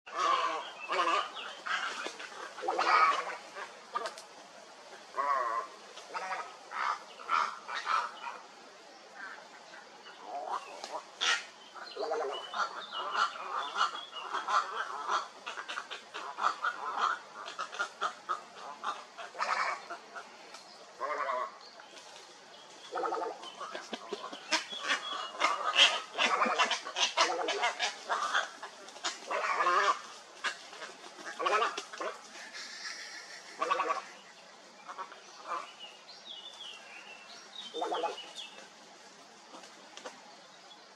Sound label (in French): Heronniere